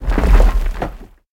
reel Bounce background; parthenon

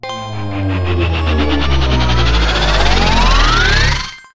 pre simultaneous reels stop; spinsane

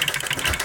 dice roll loop; fairyred

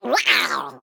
crab VO; reef raider

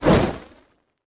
Hit Light Volcano; wild worlds

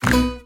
pointer count up; willyshotchillies

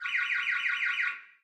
amb Police Siren Oct Up; hotline2

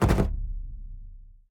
spin Button Click; excalibur

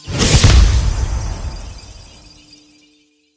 fairy magic transform; fairyred

